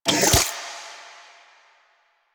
Charge, Sidearm; Weapons; Warframe; Tenno; Wraith Sidearm; Epitaph Prime

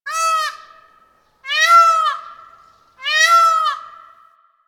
Forest Bird Cries; Warframe; Ambience; Grineer Forest

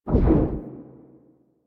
Dash Burst; Powers; Movement; Warframe; Focus; Operators; Wraith

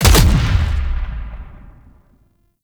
Infested Shotgun Alt Fire Punch; Weapons

